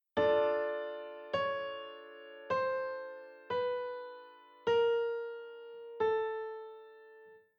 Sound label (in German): Tango Chromatik; Seiber